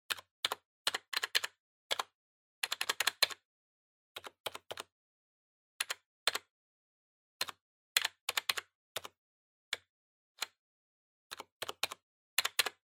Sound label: PC Keyboard Arrow